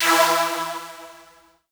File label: Synth Hit E; Trance; Synth Hits; Zero-G Trance Inducer; 90 s Sample CDs